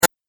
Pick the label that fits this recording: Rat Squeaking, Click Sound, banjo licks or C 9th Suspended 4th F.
Click Sound